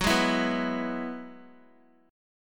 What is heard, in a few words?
Gb 7th Suspended 2nd Suspended 4th, Guitar; Gb7sus2sus4 strummed